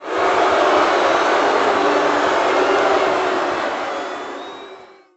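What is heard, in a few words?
Boxing Crowd Large Boo; Crowds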